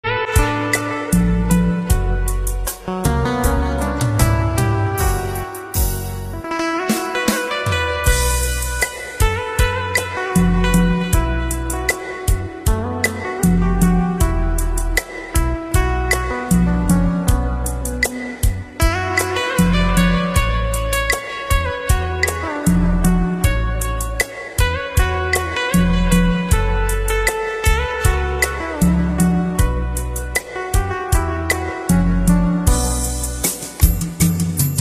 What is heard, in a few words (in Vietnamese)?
Hòa Tấu Rumba Không Lời Hòa Tấu Guitar